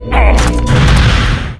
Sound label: die; Monster; 레이드; 낭리도혁천조